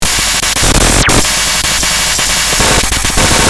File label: glitch